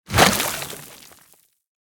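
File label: Nu Drum Old Bass Short Rhythm; smite; kuzenbo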